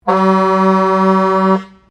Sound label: Truck Kenworth 12V71 Horn Long, Old Kenworth truck; 1969; Vehicles